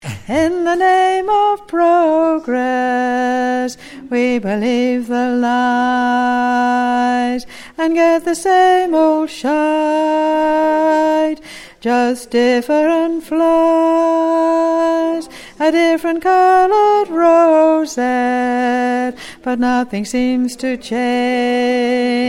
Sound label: alto